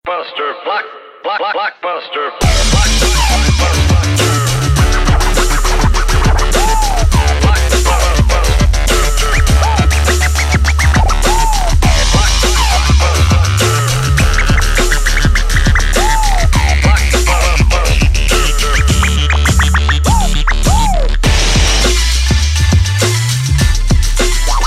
ringtone

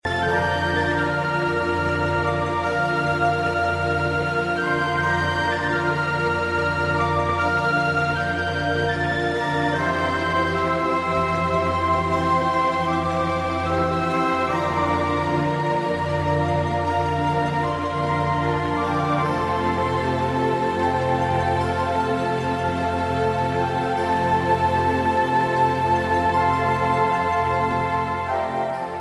magical seaside breeze soft tone ringtone

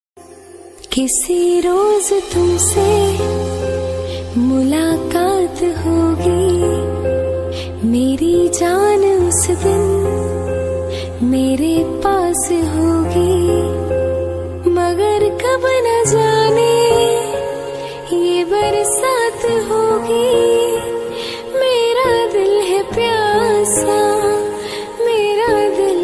female version ringtone

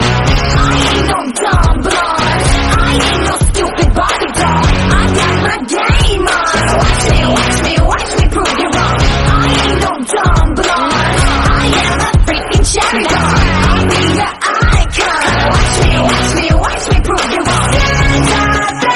background music tone ringtone

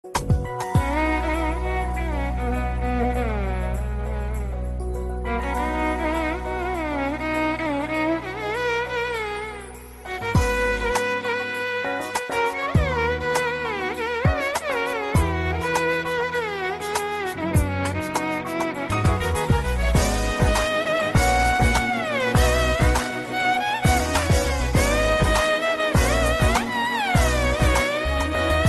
violin ringtone

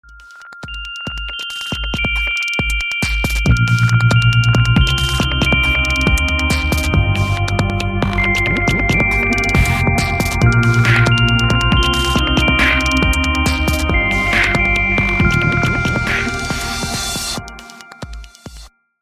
english melody ringtone

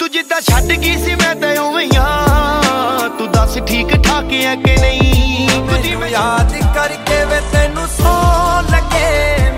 punjabi sad song ringtone

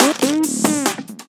loopy iphone notification ringtone

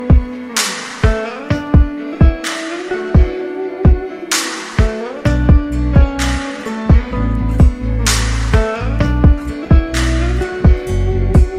hindi music ringtone, bollywood ringtones

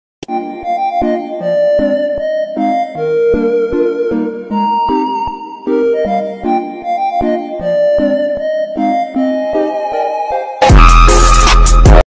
Oppo alarm flute ringtone

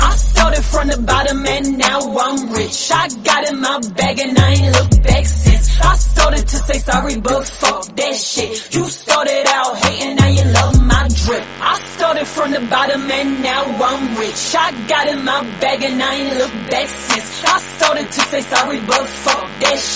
fast mobile tone ringtone

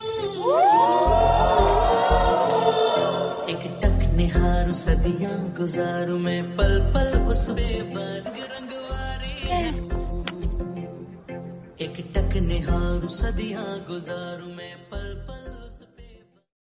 romantic bollywood tone ringtone

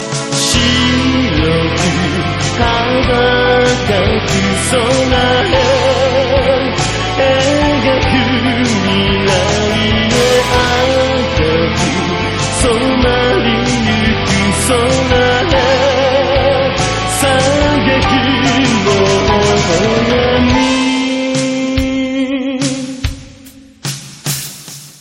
waltz ringtone

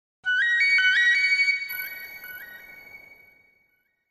do mi so ringtone, nokia sms ringtones

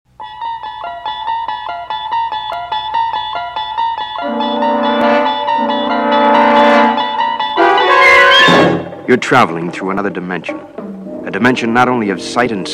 cool background music ringtone

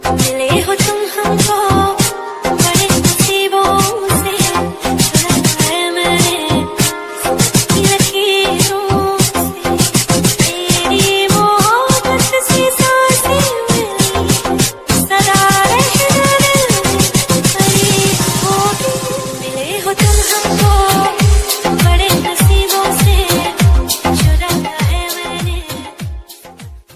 love music hindi ringtone; english ringtones

